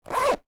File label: bag zipper, cloth